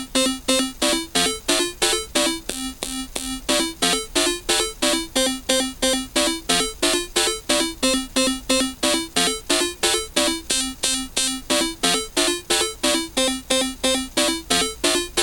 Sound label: Two Tone 8 Step ADSR